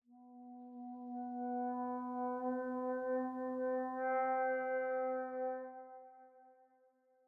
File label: Scary Scratchy; The Last of Us